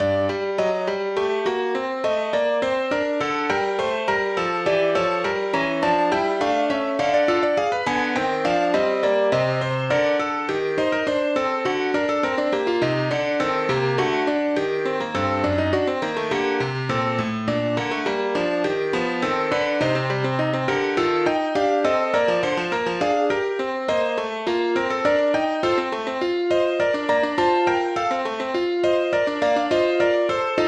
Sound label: Andante in G minor; BWV 969